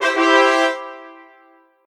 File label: win trumpet